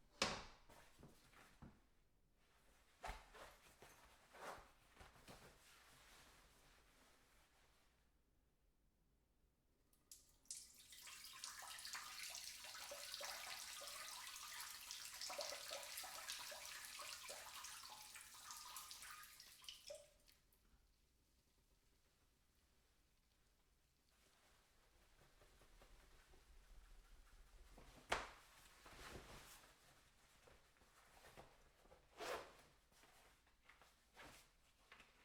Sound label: urination in bathroom, foley